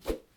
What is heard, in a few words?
zip; jocly; mana